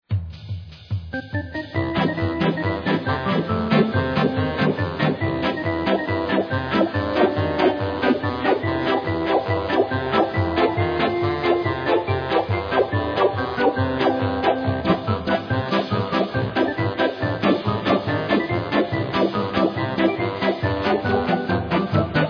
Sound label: funny, music; pbskids; go studio